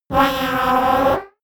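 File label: rumble storm short, science fiction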